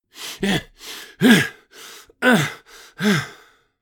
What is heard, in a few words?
Old man rapid breathing